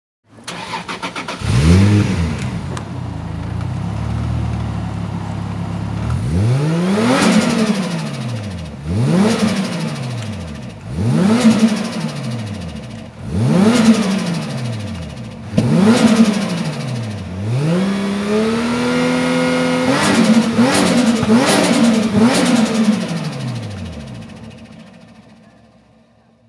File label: Ignition Exhaust Sound